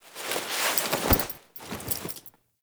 Foley Action Default Death Fall, Foley Default Clothing; Characters; Player